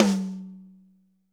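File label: hi tom rimshot, drum kits large; 90s sample CD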